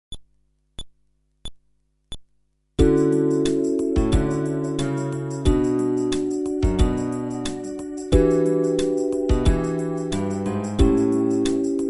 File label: Groove, fretless bass